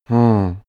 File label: male sigh disappointed